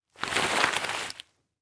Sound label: Impact Brick Drag Through Leaf Debris